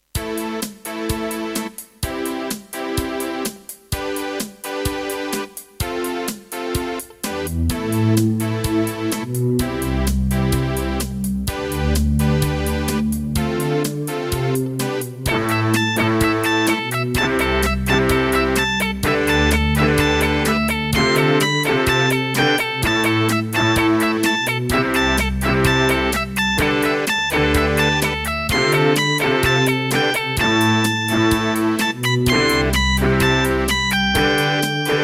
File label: Creative Wave Blaster II; raptor call of the shadows